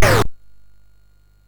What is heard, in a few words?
sid MOS6581R4; Tiger Mission